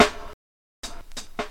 wet steel snare drum g sharp key, snare drum tuned to the G# note; snare drum samples